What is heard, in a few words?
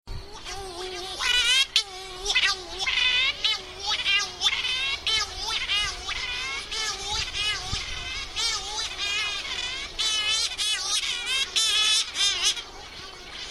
Corys Shearwater; Brent Plater